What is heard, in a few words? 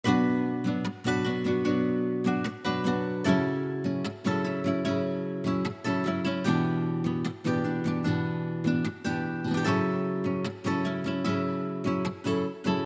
Sixteen Bars 2 Octave Nylon Fingered, real guitar